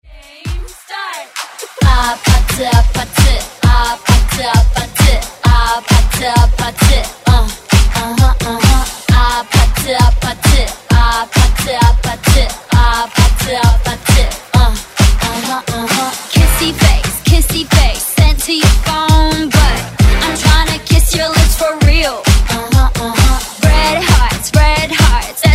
Kpop; Redrum

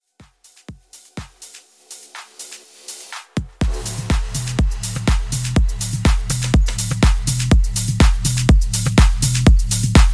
90s House Mix; Remixfreakz

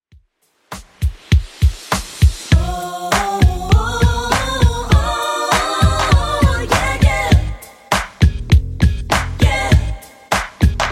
90s Redrum 100bpm

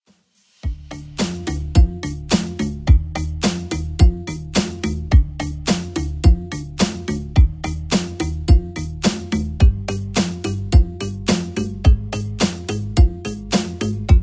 80s Redrum 107bpm

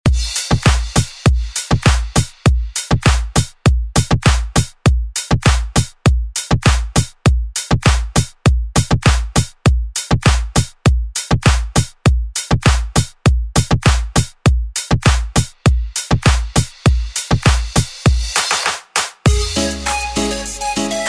Oldschool Redrum; Deejay Hit

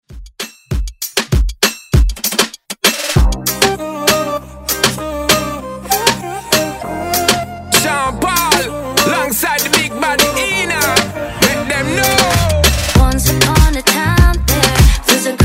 moombahton redrum

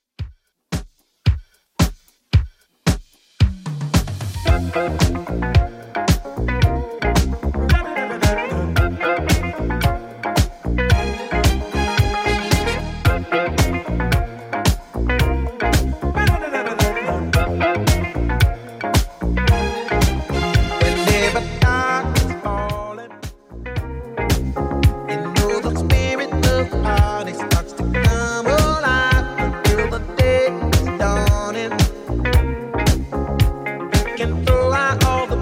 80s Redrum 112bpm

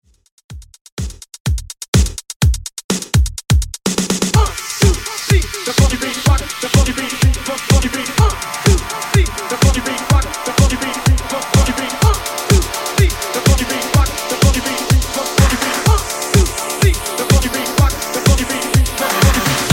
Throwback Redrum 125 Bpm Clean

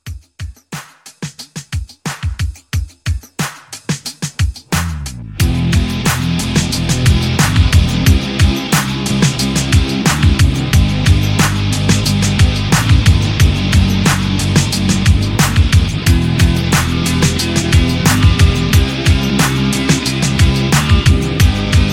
Re Drum 90

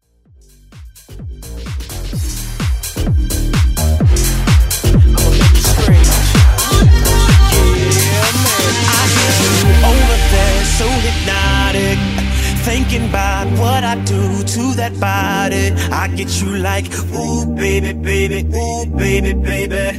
MashUp

